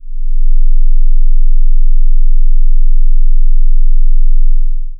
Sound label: bass; bass explorer demo